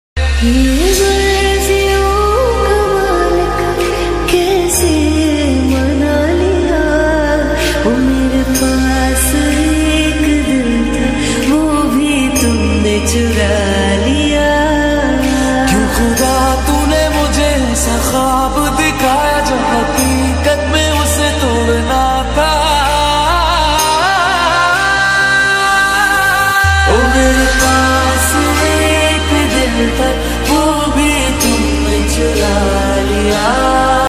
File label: tamil song ringtone tamil love ringtone bgm love ringtone bgm